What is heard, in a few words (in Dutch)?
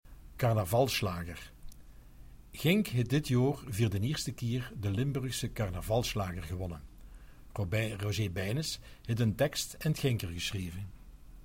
Carnavalschlager